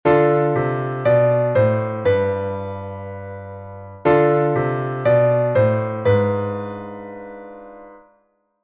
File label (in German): terzquartakkord; Terzquart-Accord, Terzquartsext-Accord; mendel reissmann